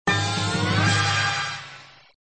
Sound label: Music Fanfare, flipper; Hand of Fate; Indy